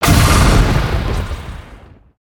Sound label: Lightning Strike; Shinobi